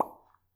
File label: plop, bubbles